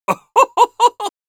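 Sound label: Scout laugh happy, Spanish; Risa feliz 3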